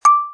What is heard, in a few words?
ball Match; merge-dragons-2